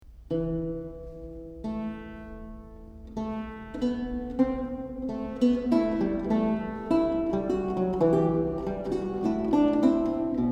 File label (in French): Trio